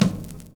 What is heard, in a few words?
TOM FILL; Univers Sons